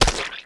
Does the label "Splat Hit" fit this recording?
Yes